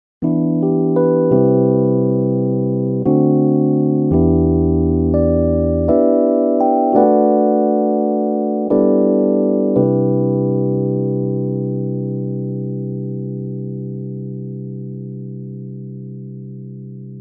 Korg SDD-3000 E-Piano ohne Effekt; UAD

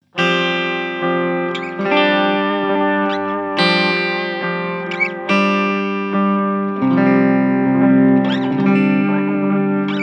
langes Delay ohne Mod

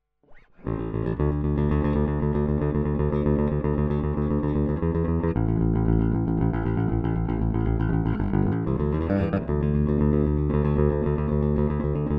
E Bass; UA Apollo Twin

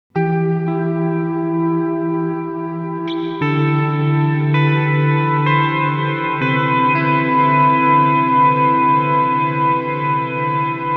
Earthquaker Devices Ledges Plate mix und length max damping 12 Uhr

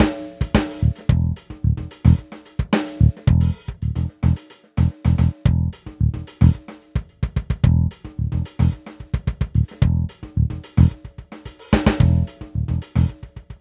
Arturia Beat Step Pro Looper variabler Start Punkt

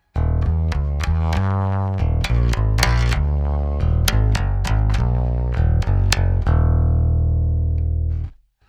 Bass Godin Fretless EQ und Comp leicht

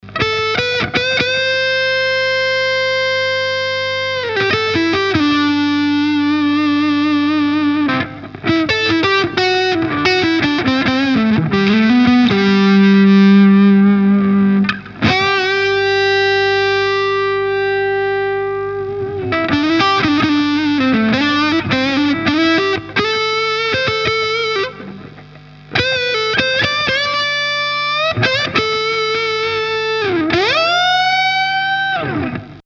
Epiphone Les Paul Custom Hals verzerrt

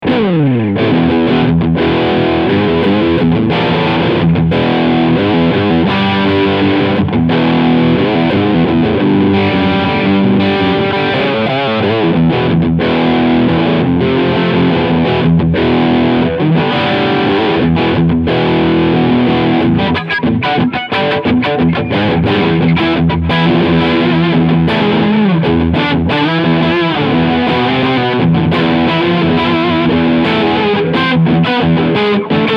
crunch steg low gain

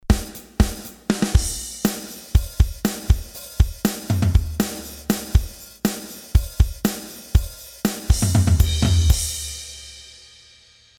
Samplitude Pro X6 Loop trocken

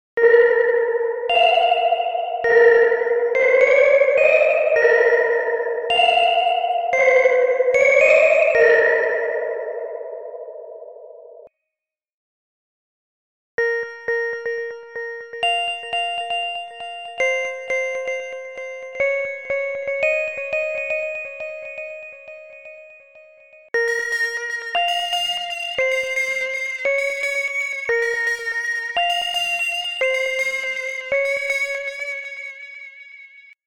Echo als Pianoverb und Effekt

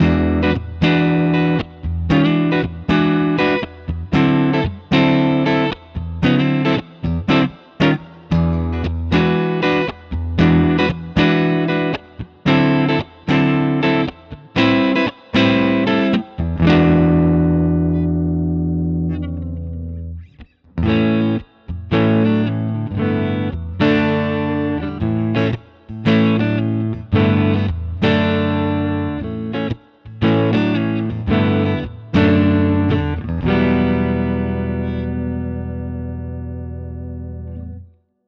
Gitarren Plug ins im Vergleich Guitar Rig 7 Warm Clean

